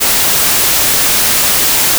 White Noise

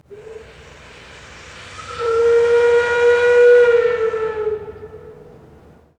Steam Signal

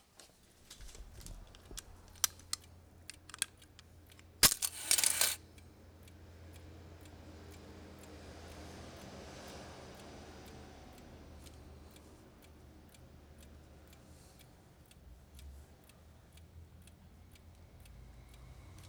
TICKING SOUND (?)

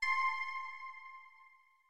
ping

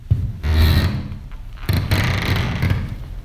Mil Floor, Squeaky floor, Milano; Squeaky floor, Milano 3"; Infinity Room

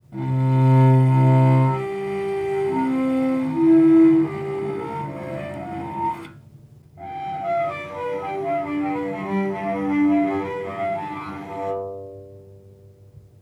Harmonics Cello

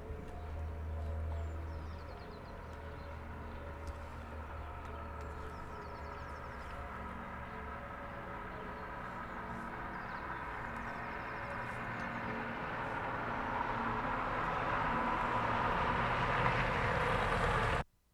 BELLS AND TRAFFIC

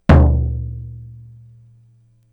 bombo

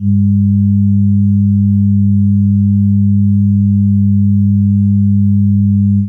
Beats Secondary

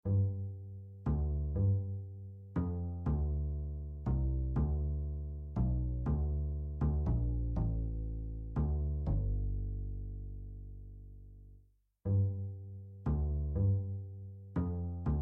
bass

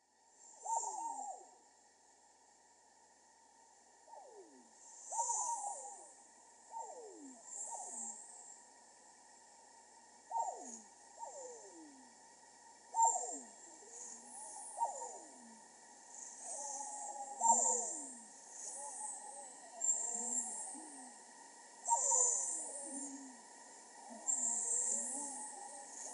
Squirrel; Garden

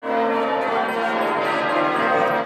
York Bells, Yorkminster Bells, UK; Yorkminster Bells, UK 2"; Infinity Room

